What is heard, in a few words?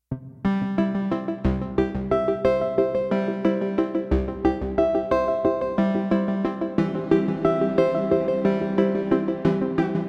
Prelude in C Major; The Well-Tempered Clavier, Book I Prelude No. 1; BWV 846